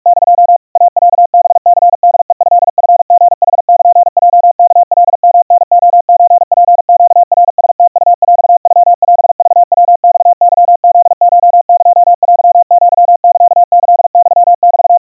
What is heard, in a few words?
iroha 150 cpm 700 Hz, Morse